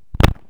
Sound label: stickfighter attack; propack